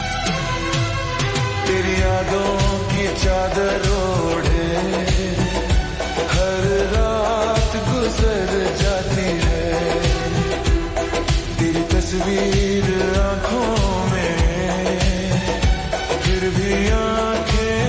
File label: Slow Reverb Version Ringtone